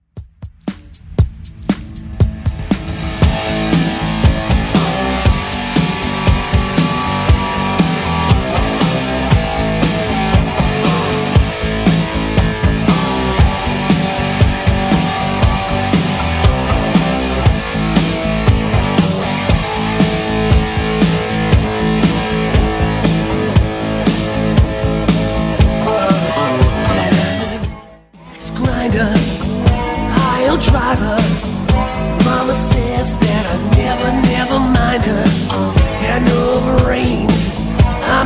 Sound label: 80s Redrum 118bpm